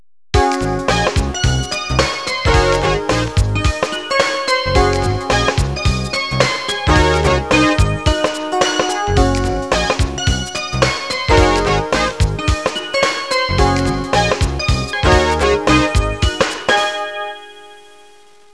suonerie businessy

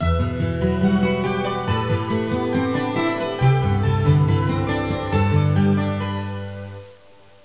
musica newage

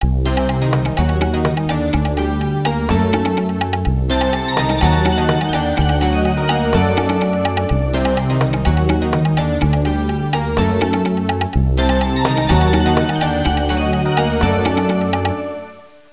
musica traditional est; fareast

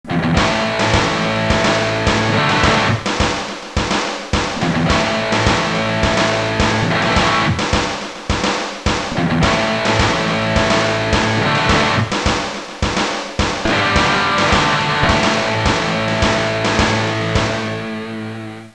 suonerie musica rock

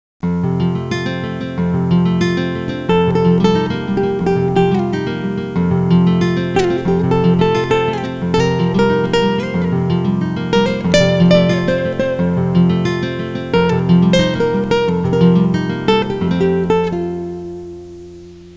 suonerie musica jazz

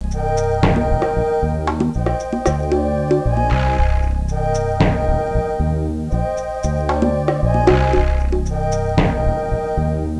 suonerie contemporanea; musica contemporary

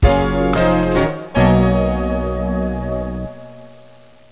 musica newage

